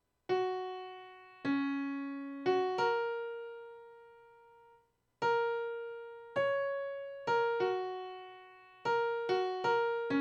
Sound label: Fanfare; Tchaikovsky